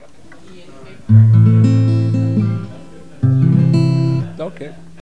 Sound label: Guitar